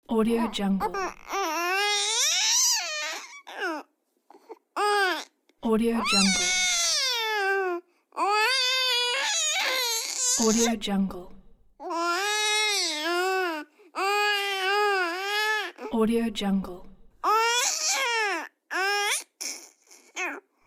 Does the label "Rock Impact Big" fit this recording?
No